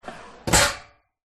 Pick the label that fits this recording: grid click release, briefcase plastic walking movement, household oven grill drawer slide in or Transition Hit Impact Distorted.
household oven grill drawer slide in